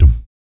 PERC, Vocal, Jazz FX, BASS PERC; Spectrasonics Vocal Planet

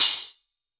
Soft Crystal, Hats; Airwindows

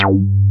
ELEK BASS; ELECTRC

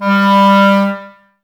clarinets, Wood Winds; Miroslav Vitous - Woodwind Brass Ensemble; 90's Sample CDs

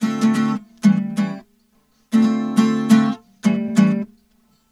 Guitar, Urban Grooves; Sonic Foundry (Sony Creative Software) - Stylus Pressure Urban Grooves on Digital Wax

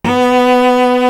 solo cello; East West; Global Sounds

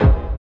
STREET; Spectrasonics; Metamorphosis

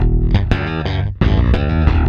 F, Bass; Spectrasonics; Bass Legends; 128 PWRSTRUM

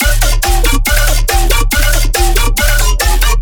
bass loop brutal, 140, D; Virtual Riot

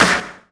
clap, hip; Best Service; Dance Mega Drums

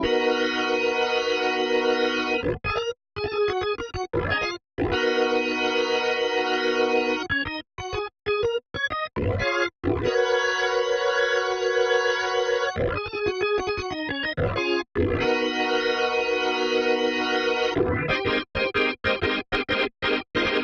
organ B, Smooth RnB, Ebmaj

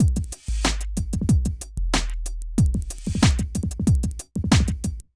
Beat

